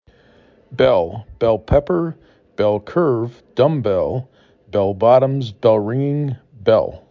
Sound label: bell